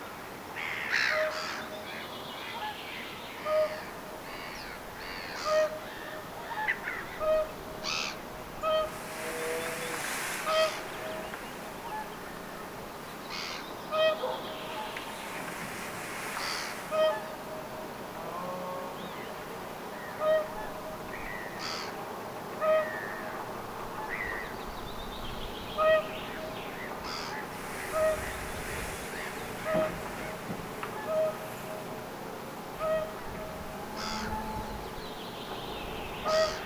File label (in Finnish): laulujoutsenen kuup tai puup aania pitkasti, kuup tai puup ääntä laulujoutsenelta, pitkästi